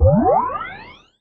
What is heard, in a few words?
combo burst; [EZ] Ekoro blue